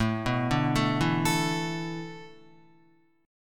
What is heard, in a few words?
A Suspended 2nd Suspended 4th down Guitar; Asus2sus4 arpeggiated